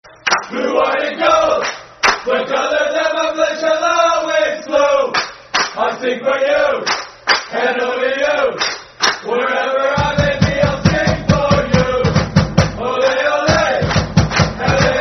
Blue White And Gold [Football Chant (Soccer Song)]; fanchants; Los Angeles Galaxy Lafc